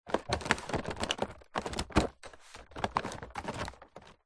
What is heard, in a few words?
leisure board game box rummage remove game parts; telstrations